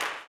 CLAP, Blues Soul; Spectrasonics Vocal Planet

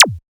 zap, e-percussion, techno; Techno ID; single-drums

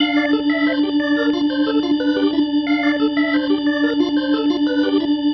Pattern, Organ

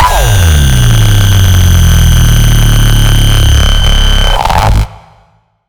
blank dist; random and out of place

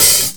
CLOSING HIHA; Greytsounds VOL-1; SDX HATS+CYM

